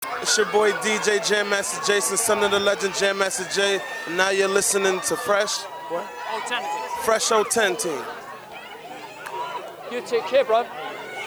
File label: Jingle